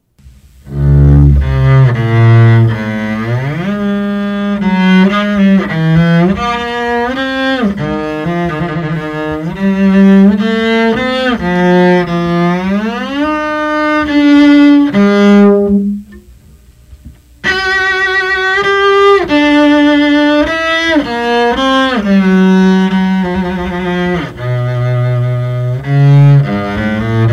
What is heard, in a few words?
Double Bass Solo